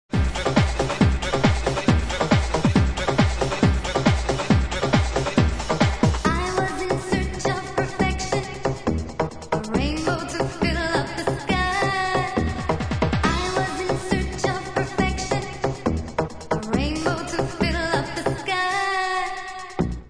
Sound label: drumtrack melody